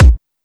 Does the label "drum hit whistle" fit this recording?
Yes